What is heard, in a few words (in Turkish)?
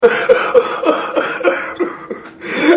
Gülme; burhan altintop